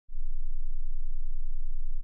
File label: 5 sec silence